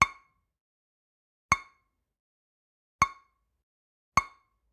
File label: Generic Click Glass Small